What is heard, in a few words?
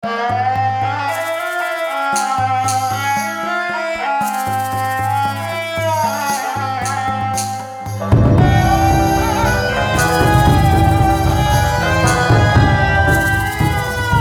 Romance Dance; Syrupent